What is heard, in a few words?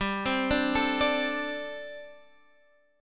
G Suspended 2nd Suspended 4th G, down, Guitar, Standard; Gsus2sus4 arpeggiated; JGuitar